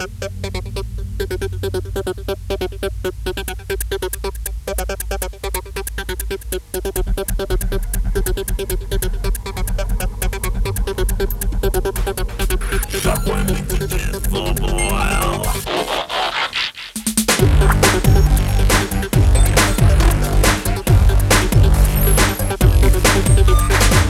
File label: elektro 28khz 8bit stereo; amiga; DEMOSCENE